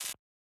Boss Footstep Stinger; Avie; Going Under

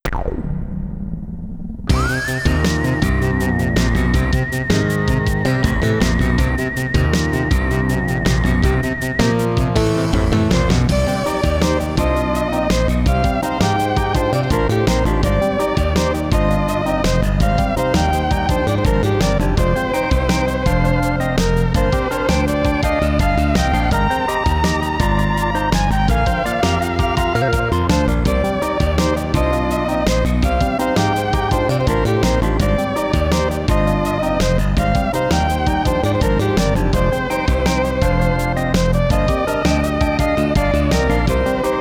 LOAD BGM [Roland LA]

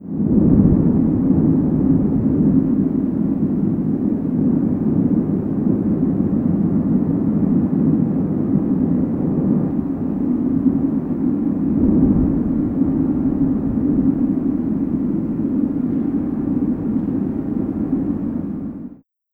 Laquinta Wind

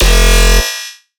Bass Laser 200 F

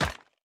Weapon Furniture

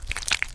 Octupus; Neighbours From Hell Console Edition; GC, Xbox

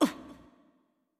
Hurt; Going Under; Jackie

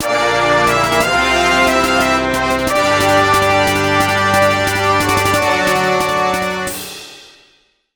Boss Fanfare; underhero linux macos windows gamerip 2018

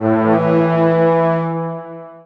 Leadership; Divine Divinity

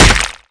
Destructable Stone Take Hit; Destructable SFX Stone

